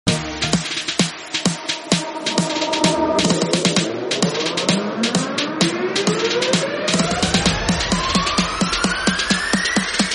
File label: Garba Song; Gujarati